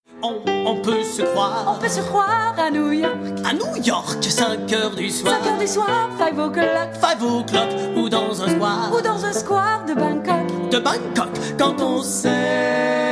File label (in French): chant